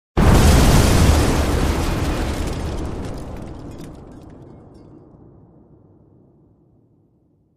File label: Explosion Debris, metal & glass